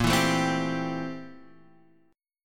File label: A Minor 7th Bb, Am7/Bb chord; Am7/Bb strummed; JGuitar